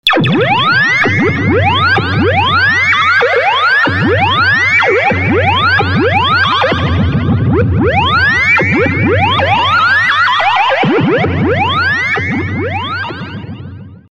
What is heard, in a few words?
SPACE DRUM